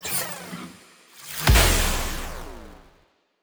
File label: play sound fx crash; Rizline